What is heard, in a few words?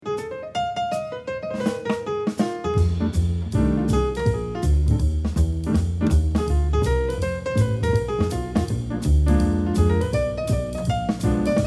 Piano Trio